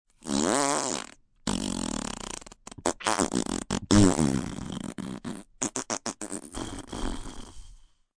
Fart Long Wet, Mouth effects, fart, long, wet, gross (human voice); VoiceBox